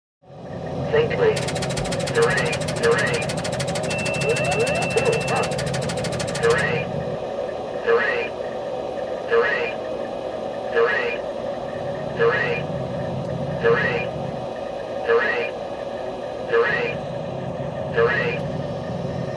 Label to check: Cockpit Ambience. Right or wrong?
Right